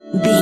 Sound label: ui menu button scroll back